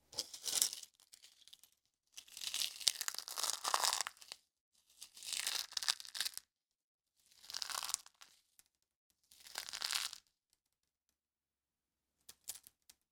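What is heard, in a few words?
rustling of shell, chicken egg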